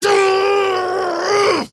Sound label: attack effort large; dota; axe